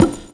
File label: BONGO; Drum Hits